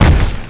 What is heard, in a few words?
hit ston; WhiteOut; XBOX; crater